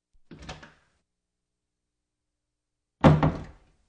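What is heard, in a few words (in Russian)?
открывается и закрывается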